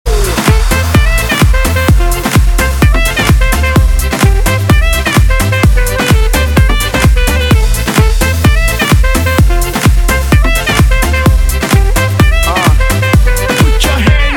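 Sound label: pleasant melody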